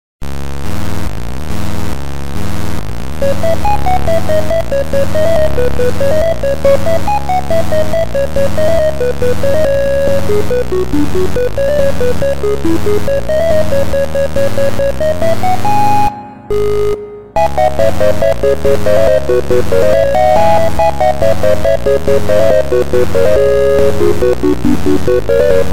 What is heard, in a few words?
Generic Chiptune Music